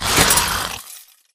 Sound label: Mvmt Servo Walk; AI Zombie Stalker; Titanfall 2